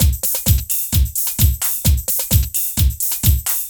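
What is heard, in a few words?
drum loops, right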